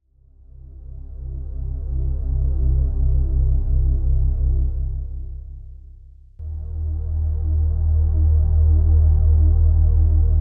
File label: Ch Drone; mks50